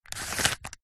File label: leisure rubiks cube single turn